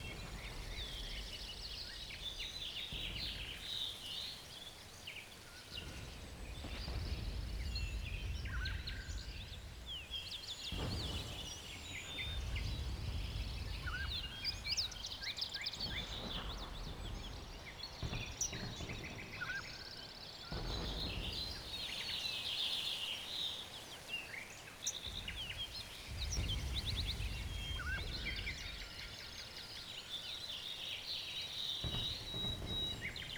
battle in far distance; WAR

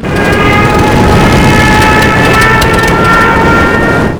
henschel crash; WAR

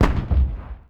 distant gun; WAR

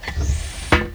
mortar load; WAR

